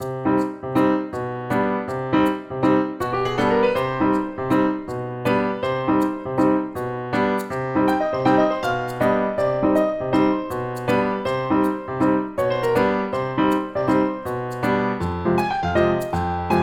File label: Keys, Salsa; Keys Music Pack Vol. 2